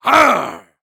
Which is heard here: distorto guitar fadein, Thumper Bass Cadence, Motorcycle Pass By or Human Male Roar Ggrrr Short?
Human Male Roar Ggrrr Short